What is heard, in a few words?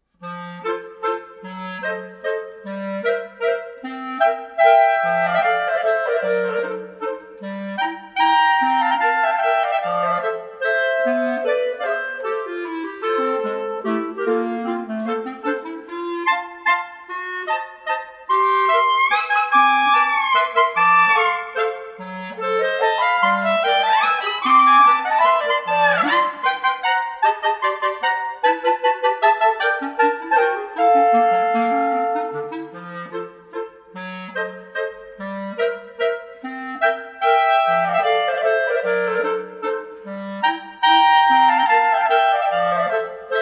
fanfare